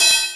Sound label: DR 220, Boss Dr220a; Drums